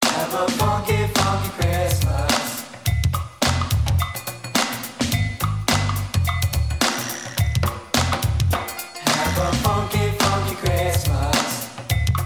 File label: Funky Christmas